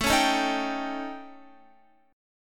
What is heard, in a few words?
A 7th Flat 5th Guitar; A7b5; strummed